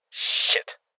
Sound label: Sniper Miss